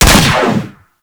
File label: Fire 2D Shot, Rifles; Snipetron